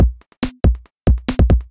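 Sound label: DRUMLOOP ELECTRO 140; Techno2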